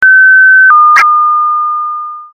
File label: chime; pictcode